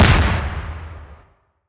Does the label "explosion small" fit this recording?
Yes